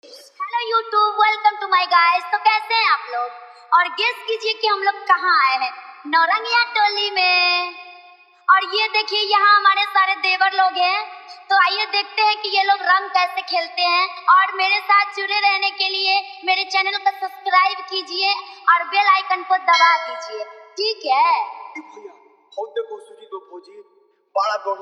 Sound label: Holi Dholki Mix